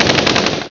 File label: cannon; TORNADO